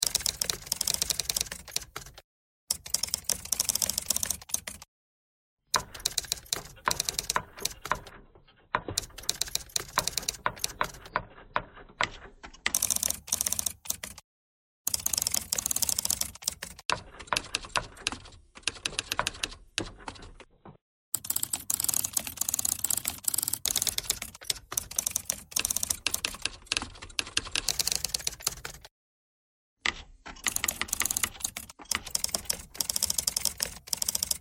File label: typewriter thread pentatonic